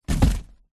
foley cardboard box set down on gritty hard surface; zapsplat